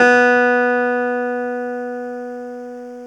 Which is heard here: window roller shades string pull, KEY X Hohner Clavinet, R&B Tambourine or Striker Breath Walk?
KEY X Hohner Clavinet